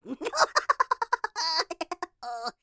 cartoon high pitched laughter hysterical funny male